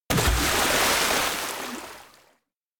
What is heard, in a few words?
lake splashdown; anemoi